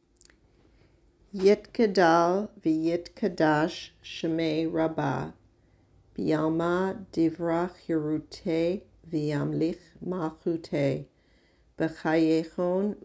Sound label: kaddish